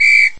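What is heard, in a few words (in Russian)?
короткий звук свистка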